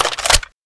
saw box in, m249

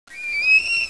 pit drone idle; betapitdrone

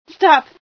pain, female sci

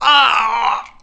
die; unreal; nali

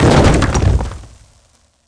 concrete

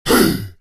tribal pain

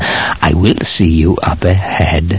run; gman

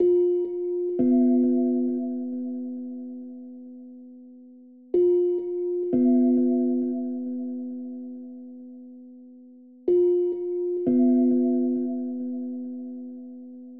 suspence, music

